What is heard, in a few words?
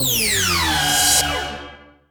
Sweeps Medium